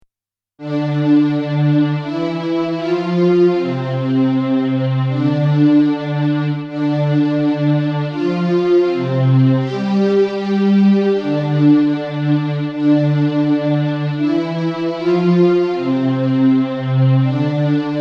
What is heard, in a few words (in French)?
cordes graves